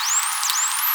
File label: Whistle